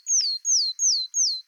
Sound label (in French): mesange boreale